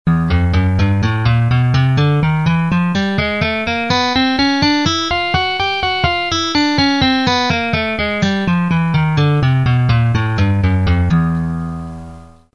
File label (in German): Tonleiter